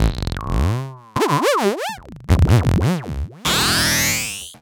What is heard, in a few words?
LFO, Noise